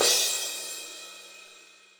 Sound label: Akai XR-20, Drum Machines, Cymbals